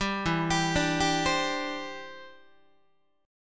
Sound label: E Minor 7th Sharp 5th E, Guitar; arpeggiated